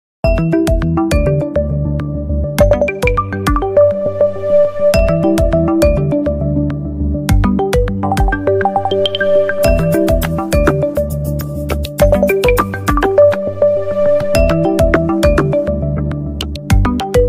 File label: Dynamic Rhythm, ringtones; Honor; Magic-7-RSR-Porsche-Design